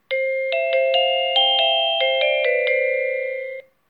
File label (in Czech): melodie